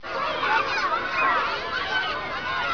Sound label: kids play